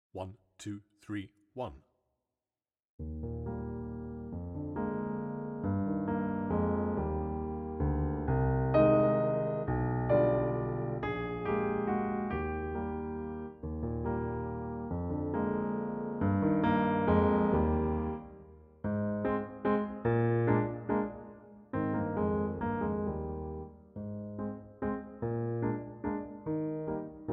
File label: accompaniment